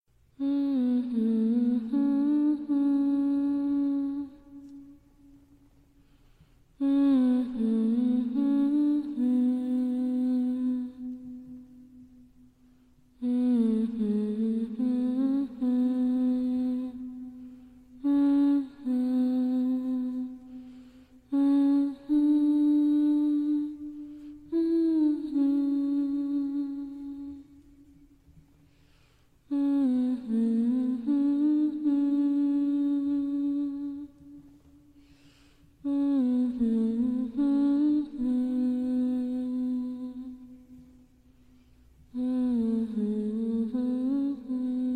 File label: lullaby